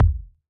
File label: Ball Collision Geo Tackleball Med